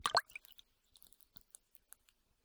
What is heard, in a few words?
Liquid Movement Plop Slosh Single; MKH60; Hzandbits; Microbes